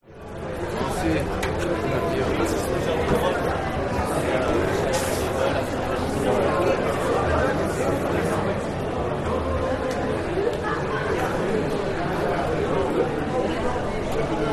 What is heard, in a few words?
France Strasbourg Cathedral Walla Street